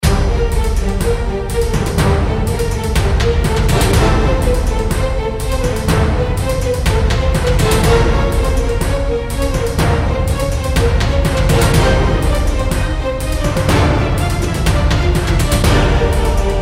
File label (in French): série conquêtes approche